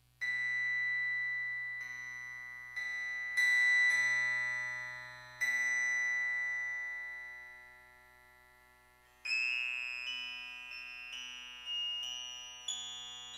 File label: harmonochord